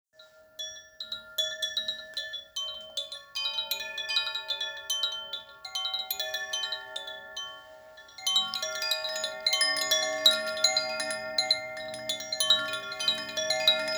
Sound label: 3 cakra chime; cakrova zvonkohra solarna 3 cakra